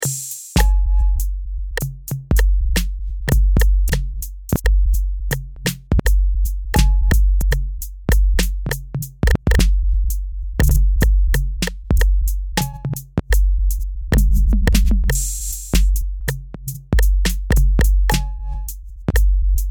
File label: m808s; old; weeklybeats-2020